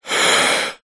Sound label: male inhale deep breath; human; zapsplat